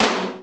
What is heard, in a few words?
TOM, Alesis HR16; DRUM MACHINES